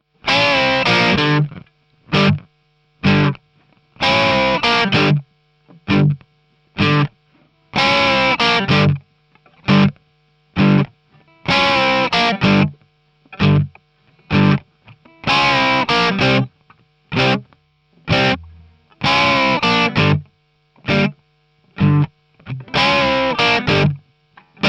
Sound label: Pentatonic Blues Solo Rhythm Part; blues solo based on the pentatonic scale